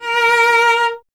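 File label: string cello A#, Solo Instruments; Miroslav Vitous; Solo Instruments VOL-1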